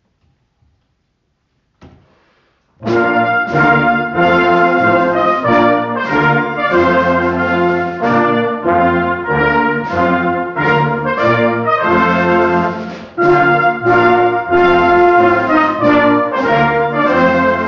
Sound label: trumpet